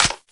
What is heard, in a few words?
sg556 clip hit, weapons; Counter-Strike Global Offensive; csgo